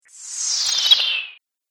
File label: Fantasy Mage Spell, magic; Magic Sound Effects